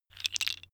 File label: tiles wood